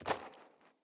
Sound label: Sandals Land Snow, Footsteps; Player